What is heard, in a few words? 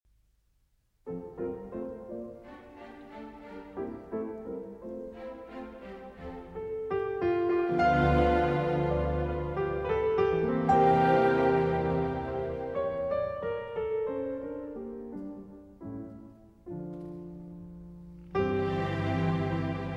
Piano Concerto in A Minor; Op. 54; Schumann; 2. Intermezzo